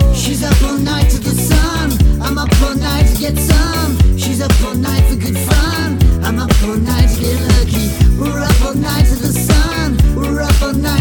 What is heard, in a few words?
band sample